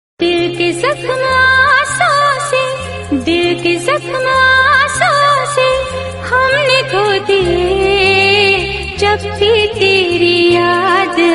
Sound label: sad hindi